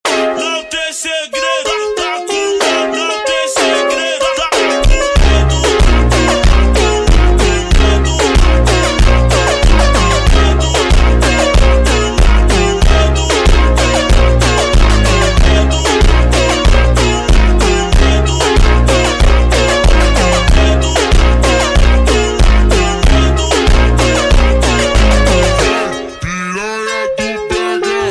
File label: Montagem ultra Slowed, funk